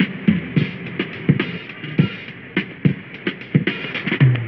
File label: strange pattern, Drum loops